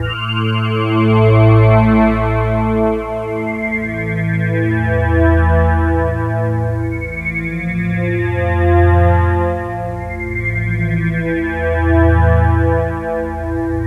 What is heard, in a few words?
Suspended Pad; Sonic Foundry (Sony Creative Software); Loop Noir